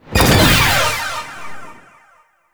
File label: Contagious; Warframe; Nineteeen Ninety Nine; Deep Archimedia